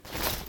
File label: Pickup item